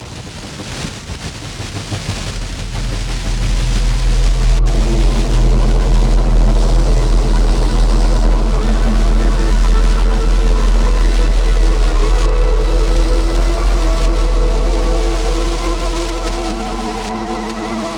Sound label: ALIEN CHOIR